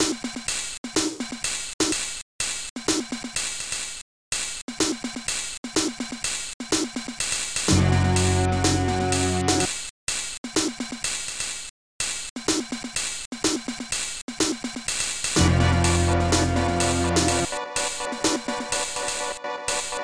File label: game Music; offence